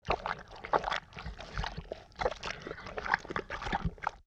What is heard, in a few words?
bubbly slime ingestion; Sound Design - Horror; Sony Pictures Sound Effects Series Volumes 1-10; Sony Creative Software